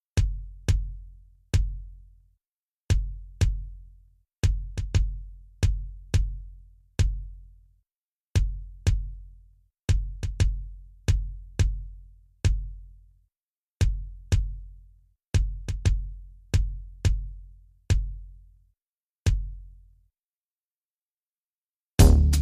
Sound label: The Big Beat Drum and Bass